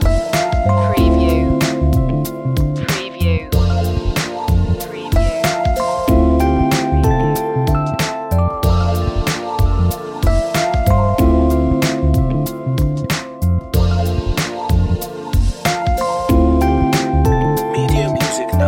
night tales loop

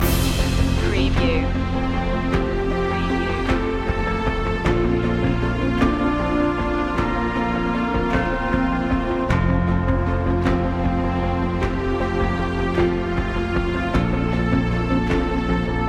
sailing to the end loop

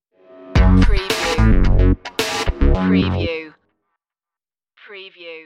80s cop funk stinger

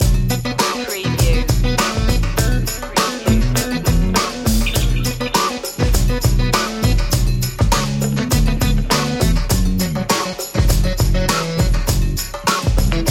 super funky

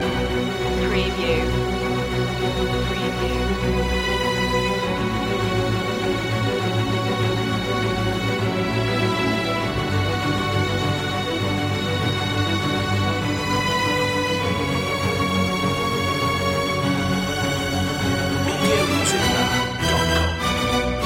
strings only

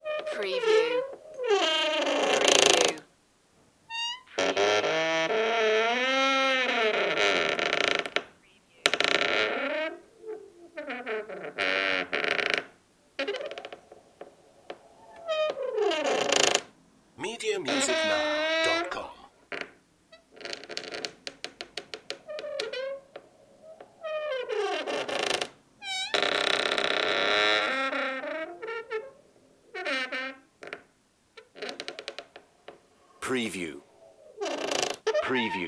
wooden door squeaks

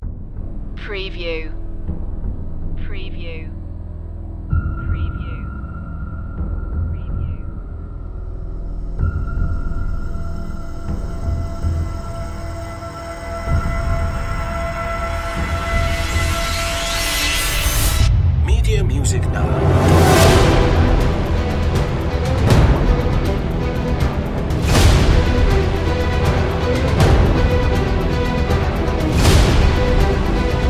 sky nation no choir

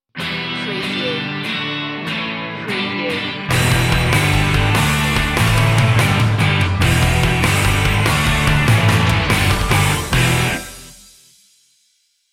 pinkday sting

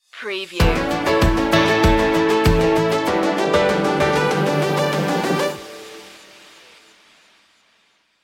petra k stinger

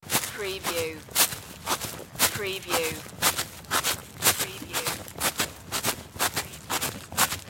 Leaf Skip

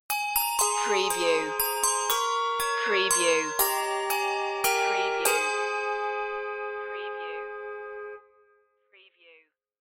handbells; wassail wassail

